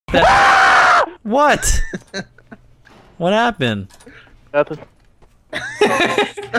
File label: SCREAM; H2O DELIRIOUS